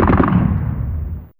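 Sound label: Machine Gun, Fairlight CMI IIe; Effects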